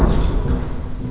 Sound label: plat stop; amphetamine